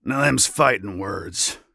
Taking Damage; Warrior; Hi-Tek Trooper; Realm Royale

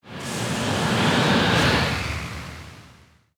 effect wind; feheroes

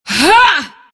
grunt; Dota 2; legion commander

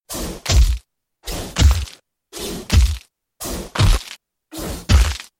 attack; Dota 2; Dark Seer

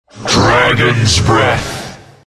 attack; Jakiro

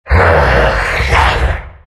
undying big snarl

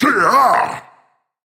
Grunt Jump; paladins; Lazarus

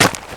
creep footstep; Radiant

